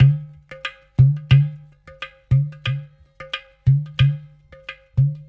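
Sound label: Ghatam, World Groove; Ambient Grooves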